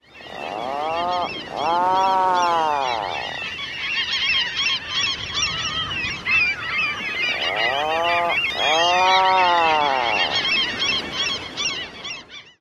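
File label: Horned Puffin snore like calls with gull calls; AK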